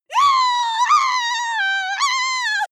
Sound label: female lunatic scream attack long high pitch; menschen; schreie